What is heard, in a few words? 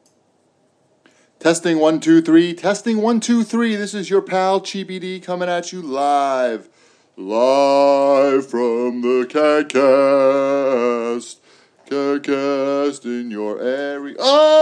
spilled iced tea on my keyboard; cagcast setup